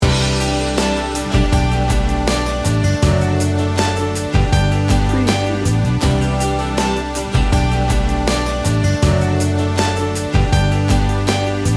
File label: laid back and warm